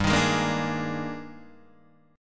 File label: D sharp Major 11th, G, guitar chord